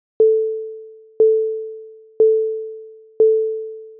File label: Envelope Test; Modelica; AnalogModeling